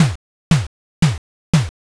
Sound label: footstep walk